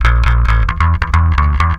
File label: 134 Garage Bass Em; Dance DJ